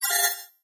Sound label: Computer Button Key Swi